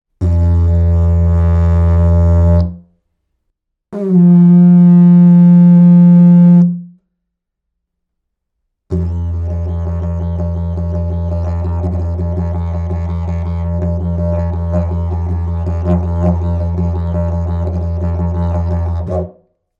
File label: F, didgeridoo proline eukalyptus f